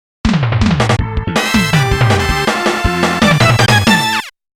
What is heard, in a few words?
Round Clear; Darius 30th Anniversary Box